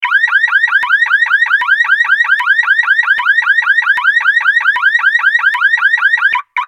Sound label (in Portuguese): alarme porta aberta carro